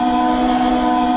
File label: step